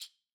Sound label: rattles Single Hit; Slate; SD SEISMIC Drum Sample Pack